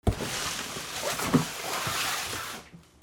FRICTION

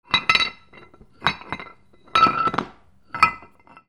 GARAGE

